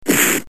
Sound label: big punch; street fighter